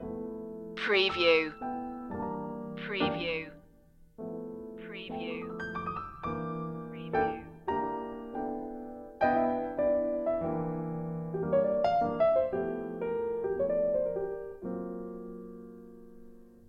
muddy water loop

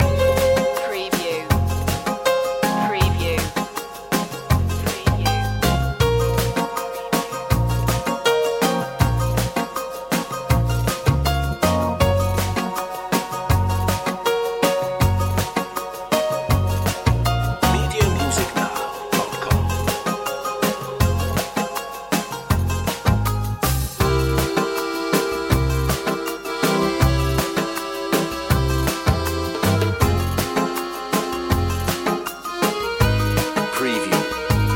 gypsy wedding loop

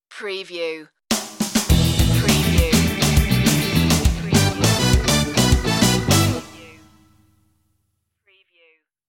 chat show stinger

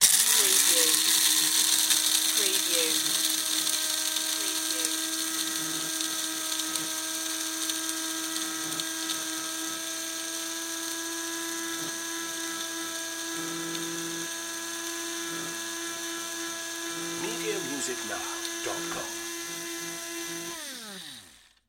Coffee Bean Grinder

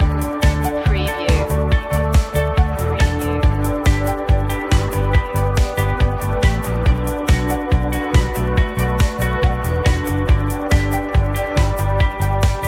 loop

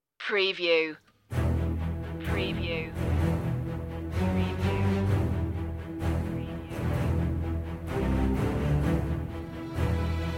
the revenge of the damned strings

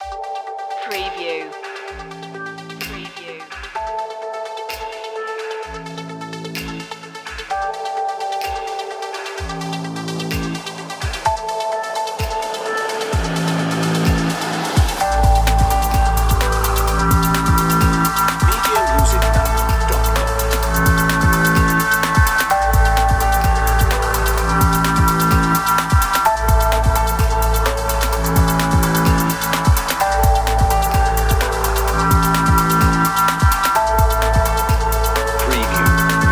chill out dream

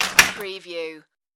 fence door close

